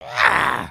Attack, creatures organic

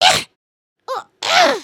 slash ninja caught; emotes

